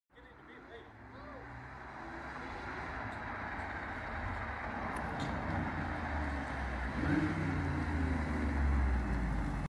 BMW i8; موتور

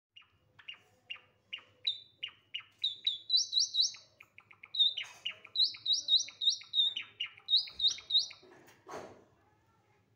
Java sparrow

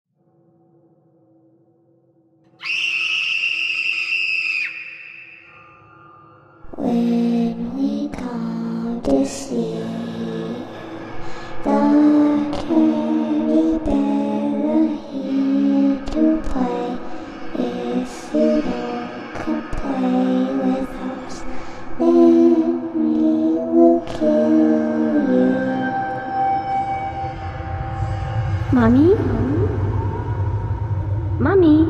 child's soul

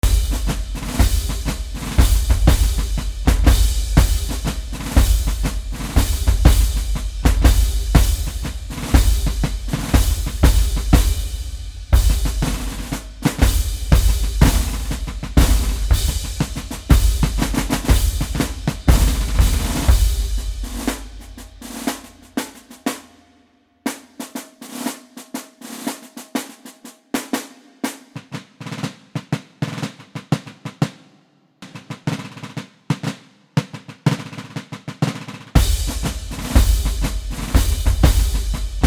marching drums drum solo

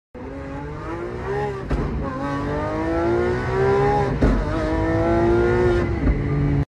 Car Acceleration

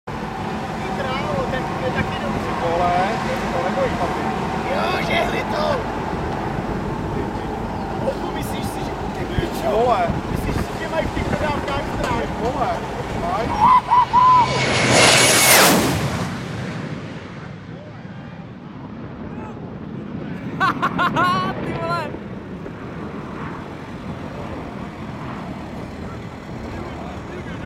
MiG-29, جنگنده میگ 29

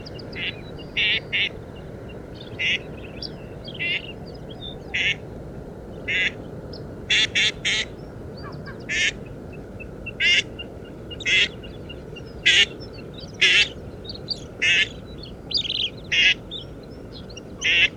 Northern pintail

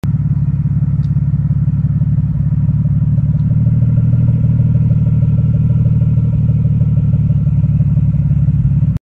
Aston Martin, اگزوز

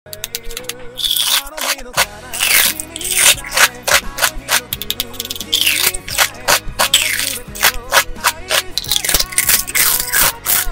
Candy, خوردن آبنبات

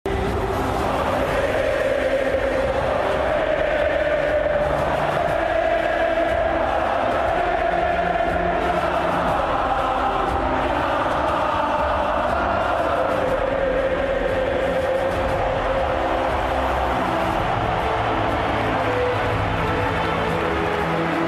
Santiago Bernabéu Stadium; ورزشگاه سانتیاگو برنابئو

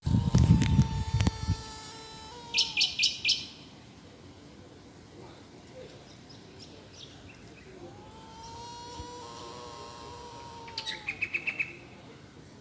Bush stone curlew

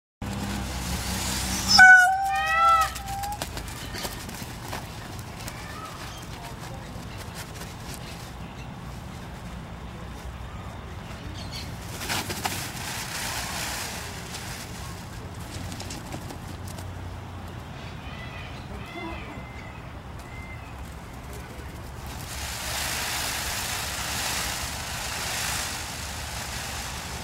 mating sound peacock

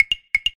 Water leak notification; شیائومی

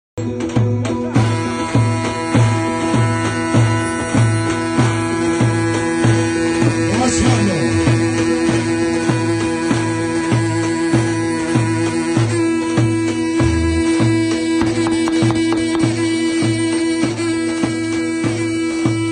saz hakhamaneshi, بختیاری; ساز هخامنشی

